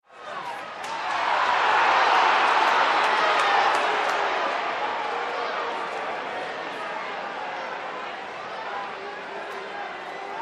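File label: shouts of displeasure of a crowd of people at a stadium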